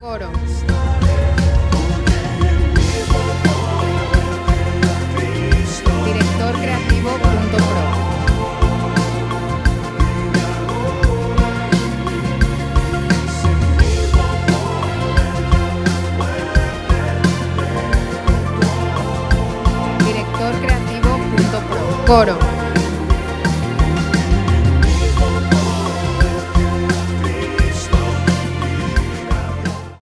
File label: E 87 4 4